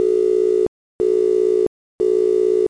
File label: BUSY